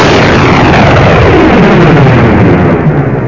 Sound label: fire heavy